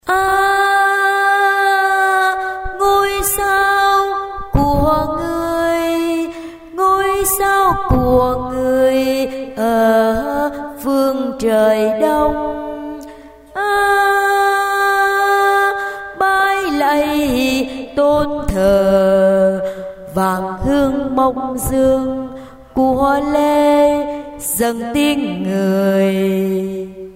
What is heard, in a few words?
basso